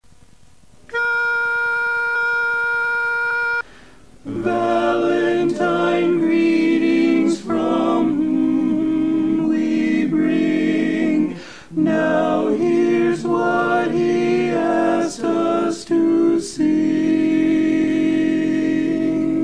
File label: TENOR Bb Greetings, barbershop quartet